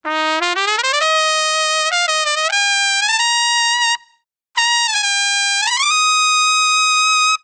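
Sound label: trumpet fx; Musical Instruments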